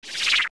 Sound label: Deku Nut Freeze; A Deku Nut freezing an enemy; zelda; OOT